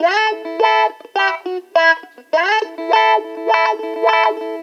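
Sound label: guitar, Roots Funk; USB Soundscan vol.41; AKAI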